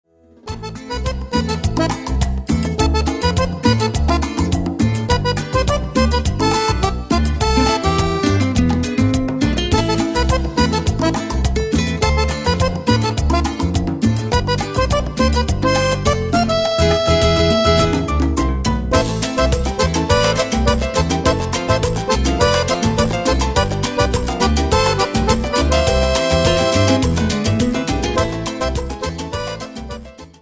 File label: Acordeon de Cuba